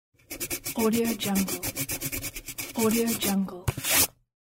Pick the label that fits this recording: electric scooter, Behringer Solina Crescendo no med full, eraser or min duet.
eraser